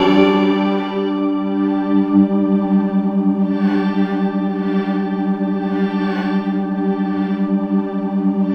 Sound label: MANTRA, STRATICUS; Spectrasonic Distorted Reality 1